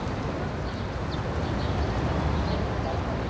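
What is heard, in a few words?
shops ambience background; Tony Hawk's Project 8; XBOX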